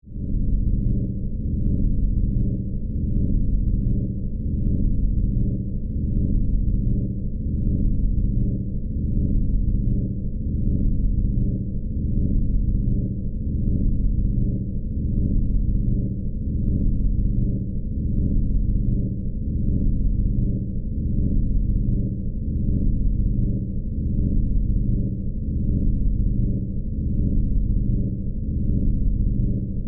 Drone Spaceship, Interior, Hallway, Power, Source, Flutter, Scifi; Science, Fiction; Spacecraft; BlastWave